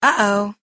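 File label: woman "oou"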